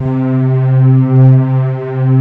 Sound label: ANA STRINGS, pads; Pro-Rec - Synthsonic